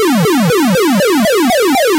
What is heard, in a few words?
sweep